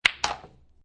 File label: Billiard, Pool, Snooker - Ball hit by other ball and falls in pocket; Ball hit by other ball and immediately falls in pocket